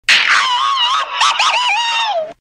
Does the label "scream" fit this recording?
Yes